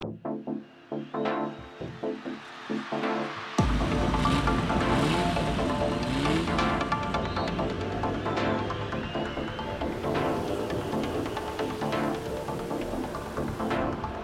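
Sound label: Music Menu Intro; Anki Drive; android ios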